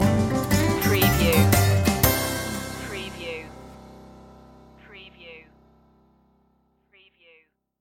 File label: closer stinger